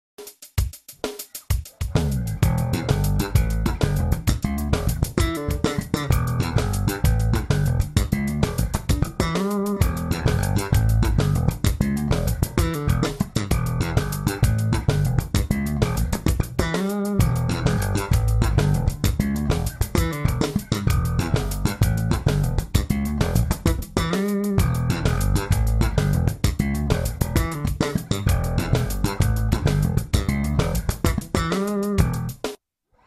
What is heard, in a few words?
Shuffle Slap in Em, Shuffle slap bass in E minor